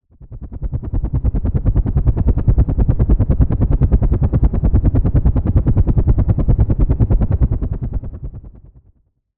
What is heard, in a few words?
SFX - Patrol Drone, Sylenth1; Routed Sound; Sylenth1 Expansion - Quantum Enforcer Vol.1